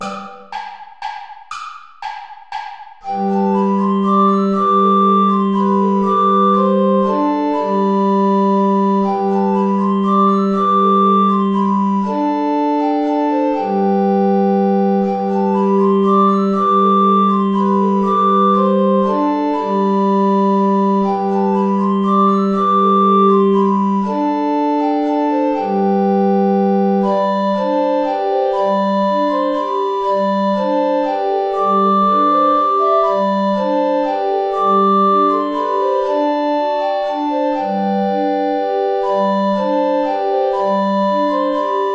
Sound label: saltarello play along without alto recorder; praetorius michael